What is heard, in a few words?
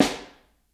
SN Overhead, Snare; Ableton Foundation FreePacks; Snare Designer